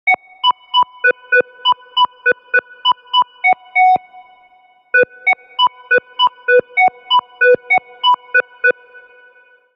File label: Sine B Minor 87bpm, MIDI Clips, Melodic; Ableton; Factory Packs; Chop and Swing